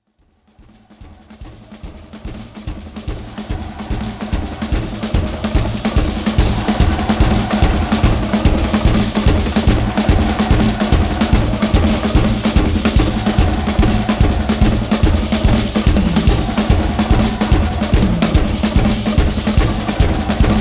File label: Batucada